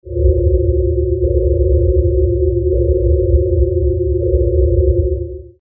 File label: tractor beam